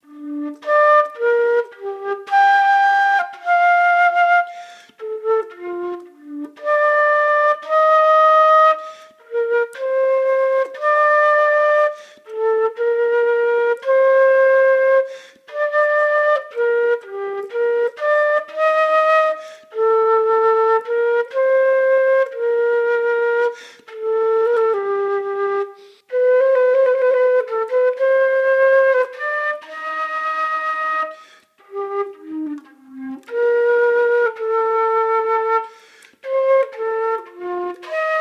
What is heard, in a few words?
Flute Version; Shortened Flute Version; BWV797; Sinfonia in G Minor; Bach